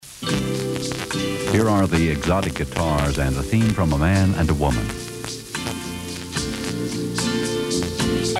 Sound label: beautiful music